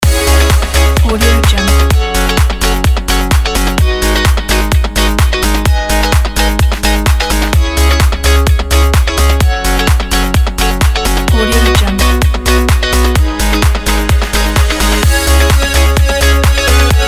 pop pack

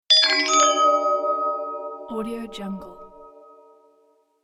magic diamond

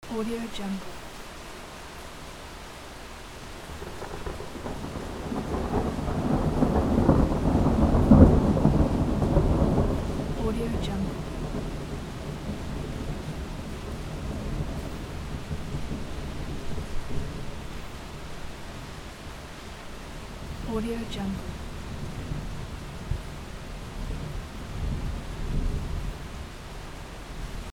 rain with thunder; audioheart

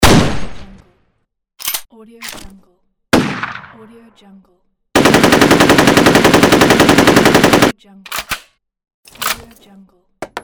fps weapons sound pack; abloom-audio

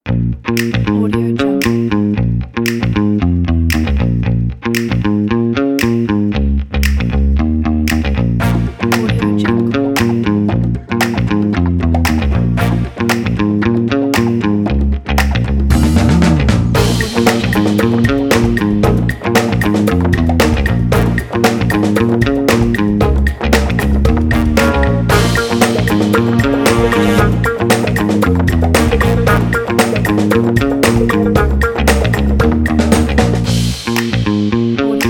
funk

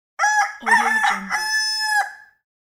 rooster crowing

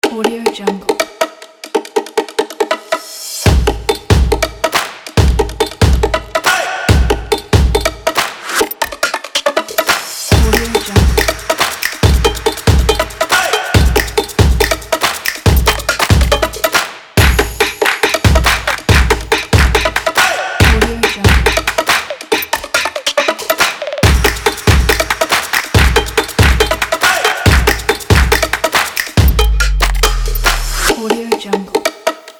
stomp on; glowcity

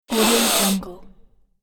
sniffing

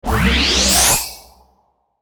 magic incantation

